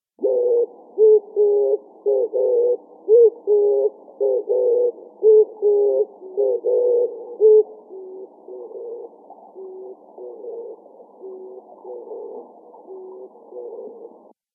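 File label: oriental turtle dove voice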